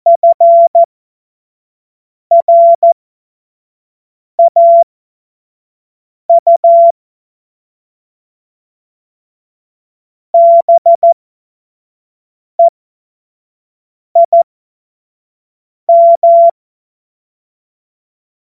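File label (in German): Witz, Morsekurs, cw morsen; Witze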